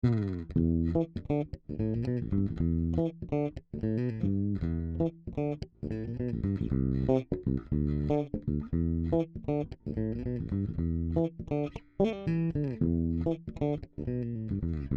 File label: Fingerstyle Bridge